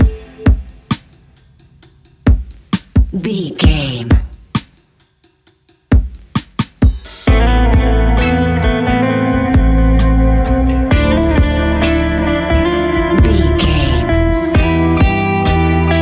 relax dreamscape lullaby, A relaxed and chilling piece of music